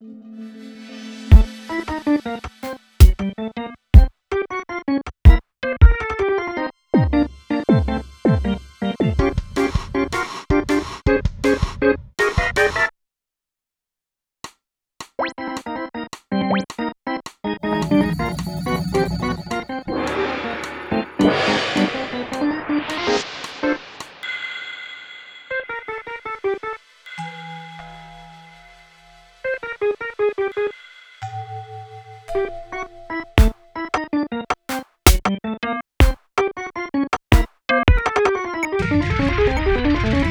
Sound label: Music